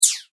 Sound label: multimedia max min interface sound lazer min; Multimedia Interface ' Minimise ' Sound ( Lazer Style Tone ); Sound Design; McKinneySound